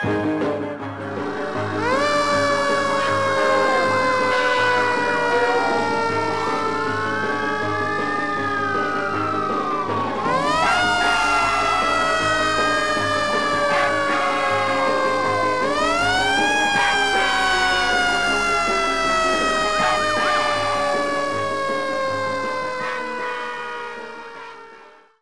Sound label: fire